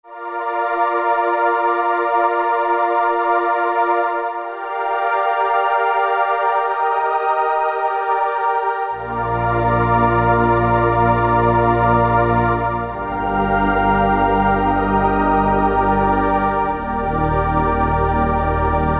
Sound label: SOFT SERINA, Akai SG01v